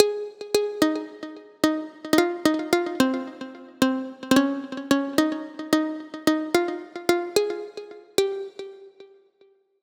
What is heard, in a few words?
Melody